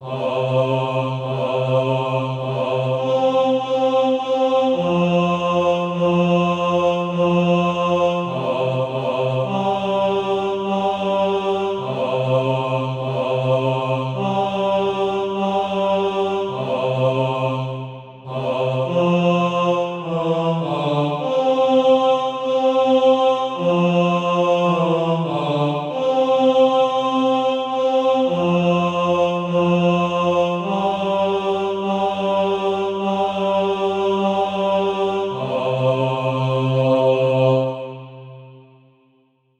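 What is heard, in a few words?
tenor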